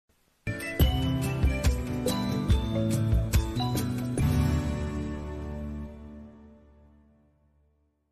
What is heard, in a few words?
intermission music; pbs kids sprout